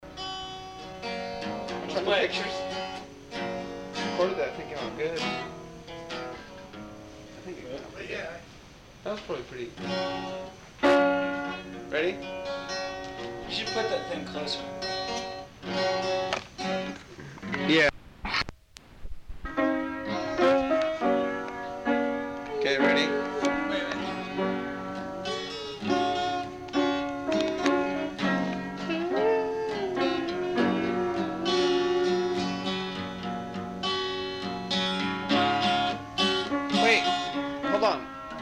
Piano

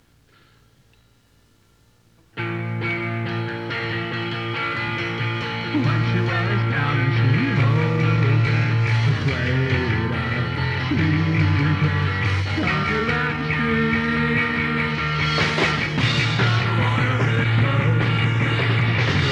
Underwater Explosions; first show arcata skate park

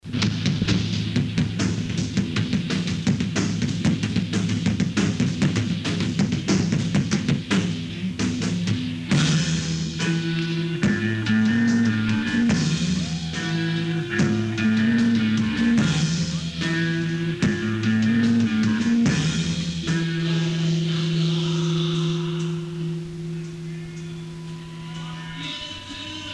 Screamery Outro

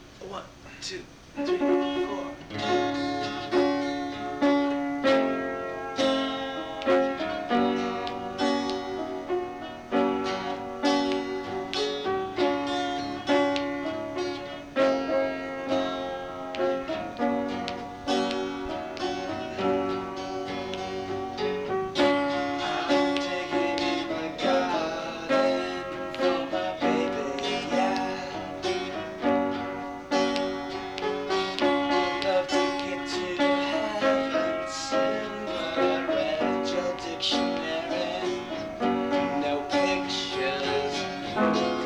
Piano Cuts Off

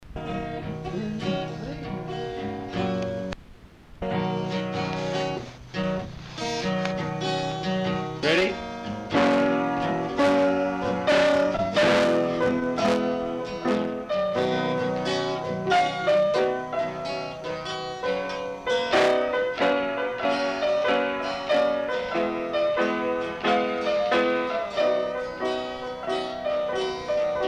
Piano

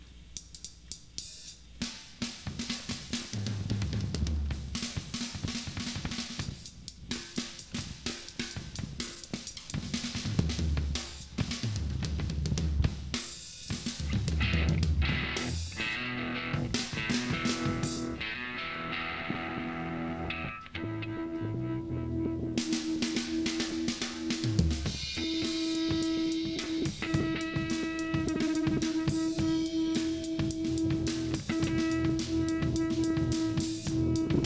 Tuning

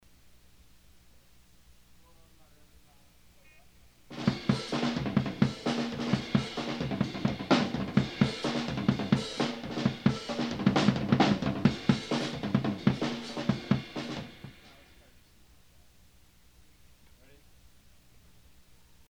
drums

